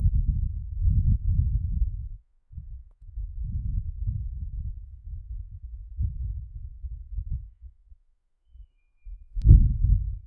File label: background noise recorded